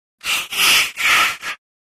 Air Scream, scary voices

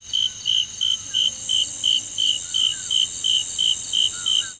Crix Cicadas Buzzing

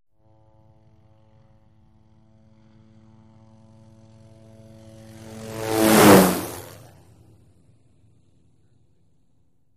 Hughes 500 Single By M

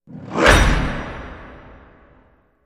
Animal Stinger Fast; stingers & whooshes

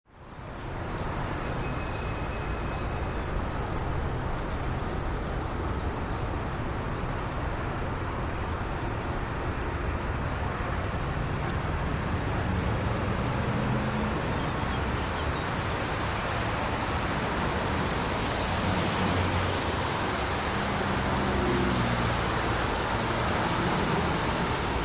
Traffic Fast Car Bys, big city

